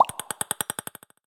Ping Pong, notifications; Honor Magic 8 Pro

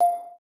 Video Record, ui; Huawei G8

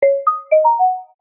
Chiffon, notifications

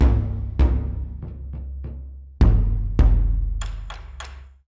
Drum; Vivo; X5-Pro

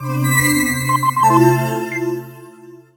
Bell, alarms; FCNT Arrows-Alpha

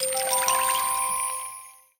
Resplendent, notifications

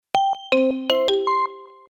Alert; ZTE; F555-Aspect

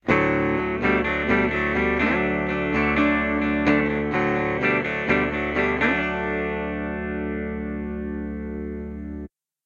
Connecting; Huawei U8160 Vodafone 858 Smart; ringtones

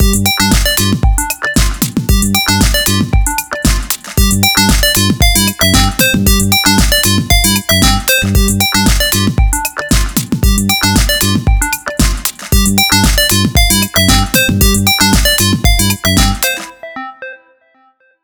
Ice Lead; Vivo X1; ringtones

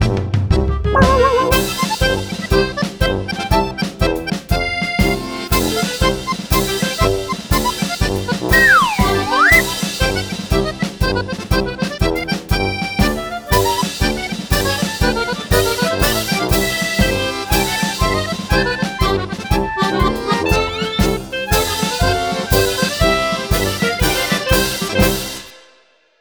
Amusement Park; Meitu; M2

